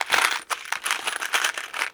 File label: Box of Push Pins; Office Supplies; 1001 Sound Effects